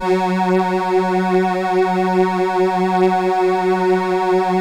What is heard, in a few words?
LAMBDA, String Machines